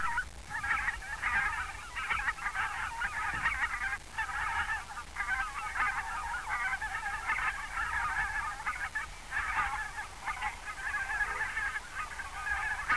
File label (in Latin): anser fabalis